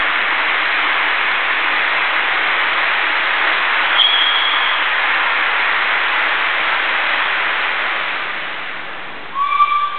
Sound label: whistle; Свисток на стадионе